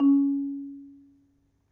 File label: Marimba p C#2; Misc; Terkelsen